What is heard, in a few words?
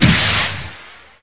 launch, skyrocket; xbmc4xbox; screensavers; rsxs-0.9